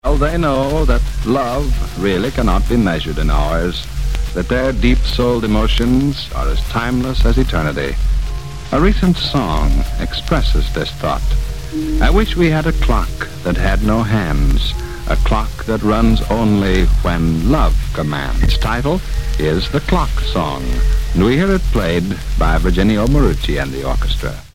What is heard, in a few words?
Clock Song Intro